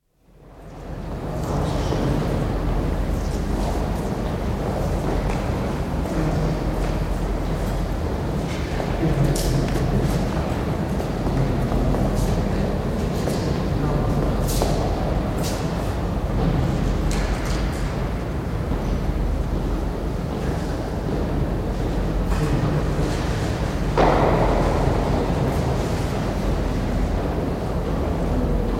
British Museum Elgin Marbles hall, quiet atmosphere of footsteps and voices in large echoey hall; Building Sound Effects; BBC